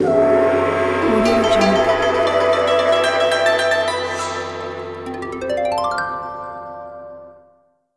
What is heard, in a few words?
chinese logo ident